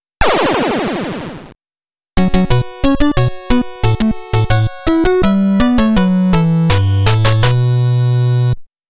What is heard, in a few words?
Game Over BGM; konami famicom chronicle vol.3 rom cassette edition; Getsu Fuuma's Death